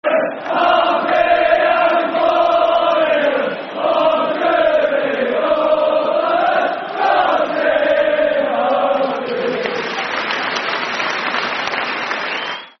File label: God Save The Queen, Football Chants; Rangers GRFC